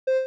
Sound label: am Synth